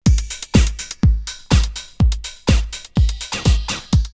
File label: Phase 124, drumloops Techno House; Stone Phase; X-Static Goldmine